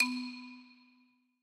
sfx golpe paredes; Bunnicula - The Cursed Diamond

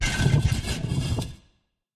sfx roca moviendose corto; Bunnicula - The Cursed Diamond

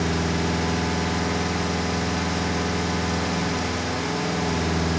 car motor andando rapido; Steven Universe - Beach City Drifters

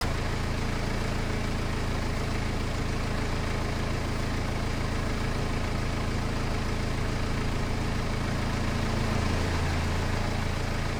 car motor parado; Steven Universe - Beach City Drifters

